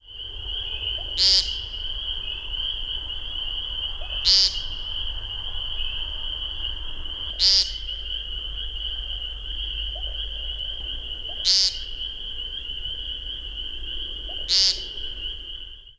Woodcock Peepers

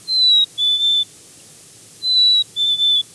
quiz call

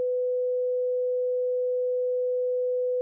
Tone 500Hz Tenth

